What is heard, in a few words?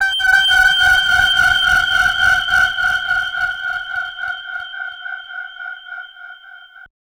175 deep fx